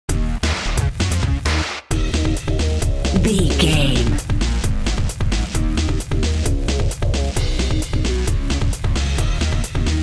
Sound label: big boss battle video game no lead